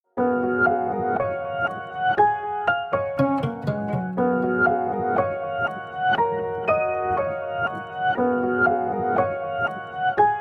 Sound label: bgm